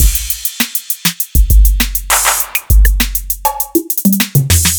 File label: acoustic 808s kit; by uppercussion